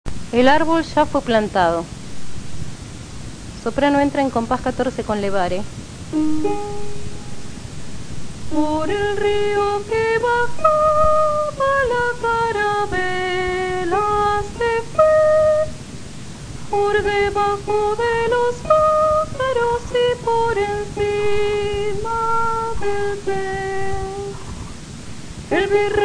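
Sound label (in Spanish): Sopranos, MIDI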